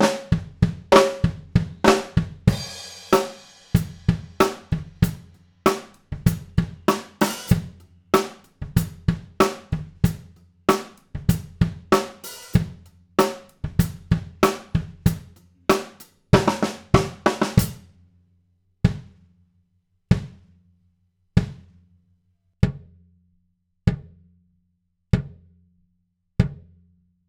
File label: Kick, drum; drum shootout